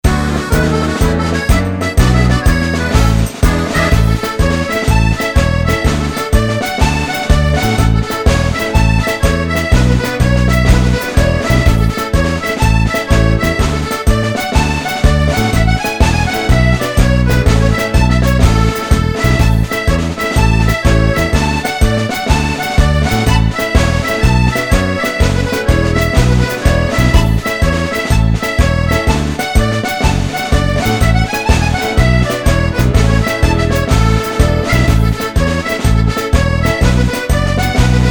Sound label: 6-8 JIG, accordion